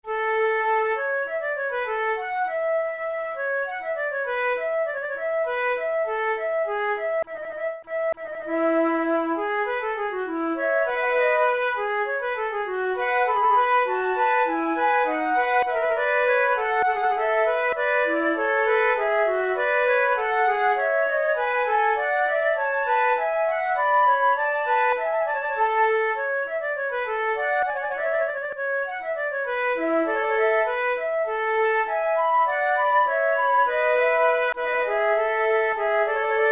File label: concerts for two flutes fugue; Monteclair; flute tunes